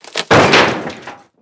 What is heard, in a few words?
metal fence; World of Tanks Blitz